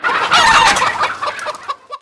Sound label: Chickens; Orphaned